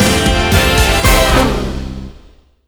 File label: bigwin end; netent